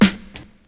hip hop snare one shot g key, steel snare drum sample tuned to the G note; snare drum samples